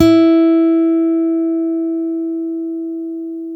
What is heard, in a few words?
STEEL, Guitars